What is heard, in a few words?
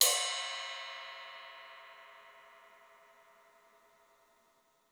CLUB RIDE; 3D Sound; 3D Drums